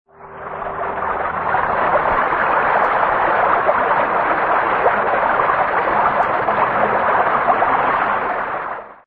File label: water; originalfilmton